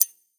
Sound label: roll; cases